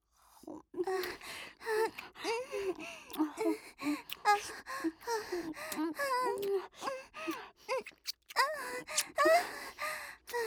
ディープ乳首舐め; 僕とお姉さんの音声; ループ音声集

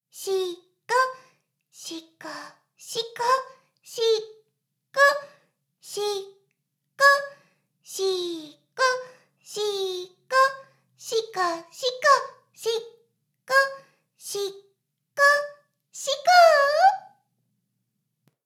メイド シコシコ音声; ASMR; ダメなメイド

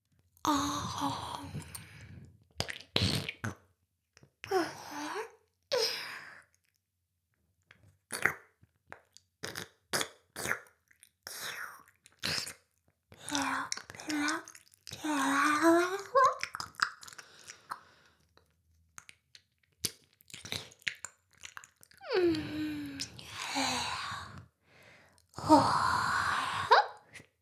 耳舐め音声; 魔法少女マスカリマッスル

